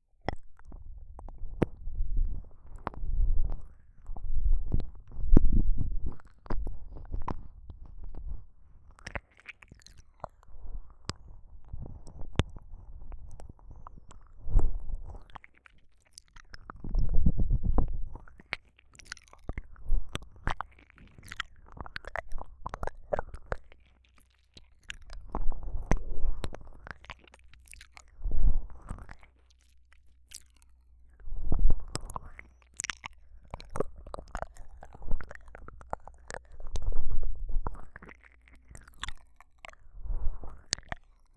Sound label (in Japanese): 徐々に深くなる耳舐め右